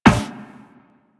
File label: Run Metal Perforated, Footsteps; Warframe; Operator